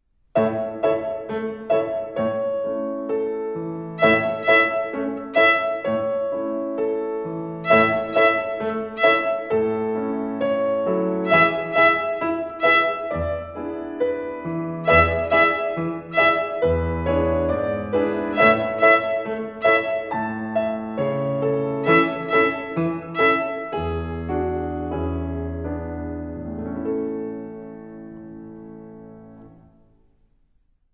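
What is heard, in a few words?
Rhythm on E Second Twinkle Rhythm, violin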